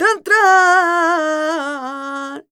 voc c#, Flamenco, Vocals, Female Cantaora; Female Cantaora 2

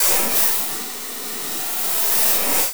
FX Scare, Drums & Drones

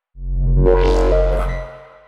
neuro, bass

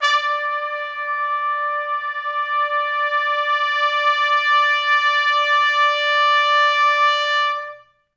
D4 -L, Pop Brass; Best Service; ProSamples vol.19; AKAI

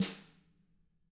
Drums, SNRR; CAMCO

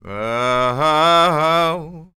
SING, Blues Soul

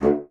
D2, BASSOON; C BASSOON; Xsample VOL-6

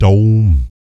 PERC, Vocal, Jazz FX, BASS PERC

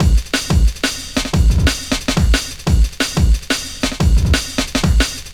Dope Break, Drum & Bass; Fluid Dynamics

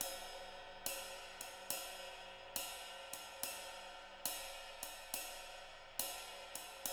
SWING, Living Drums; Sampleheads; Peter Erskine

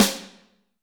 Snr Classic, Alesis D4

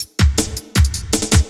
LOOP, Drum & Bass, 160 BPM

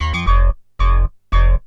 Synth hits and riffs

